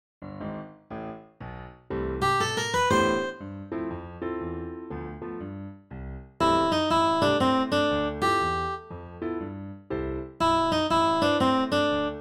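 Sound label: slow accompaniment melody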